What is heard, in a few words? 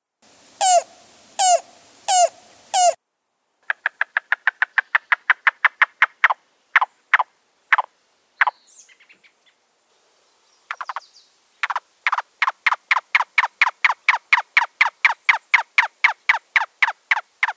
Yellow billed Cuckoo